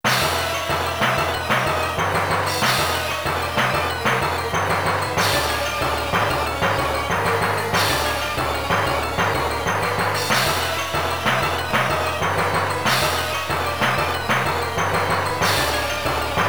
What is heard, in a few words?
Boss Battle 3 Troll, Beholder